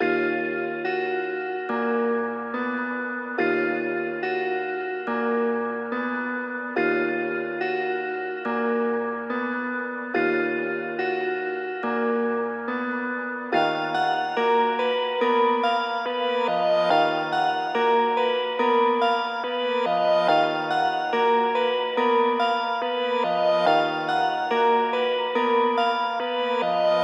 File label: 142 BPM D# Min Chords; Cymatics; Can't Die